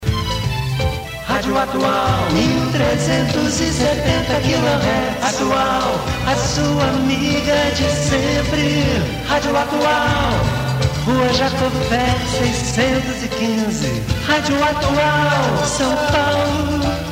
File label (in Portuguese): Radio Atual SP vinheta